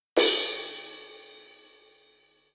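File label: Drum Analog Kit Crash Cymbal, Yamaha RM1X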